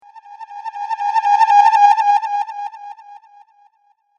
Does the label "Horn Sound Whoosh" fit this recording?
Yes